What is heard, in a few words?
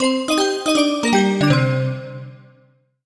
MUSIC EFFECT Bell Voice Negative; startide macos switch windows gamerip 2017